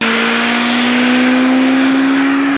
accel

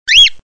chicken call

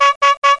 car start

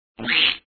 beat bird

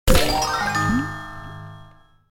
add level

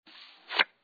flop

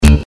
stone, collision

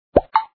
lay egg

